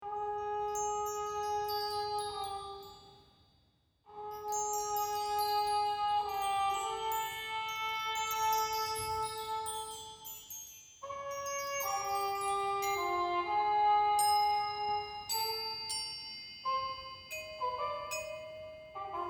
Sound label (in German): Trompete und Schlagzeug